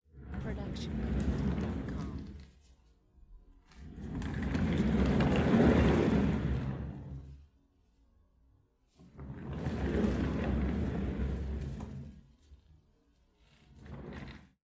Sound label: moving furniture; soundscrate